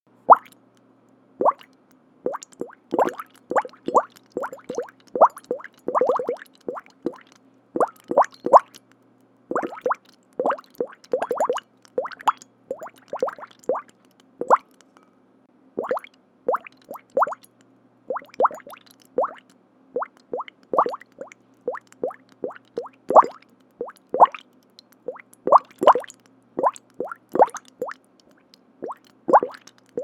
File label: Bubbles